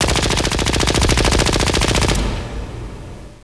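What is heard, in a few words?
machine gun burst; cathedral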